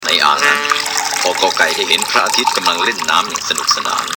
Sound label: cartoon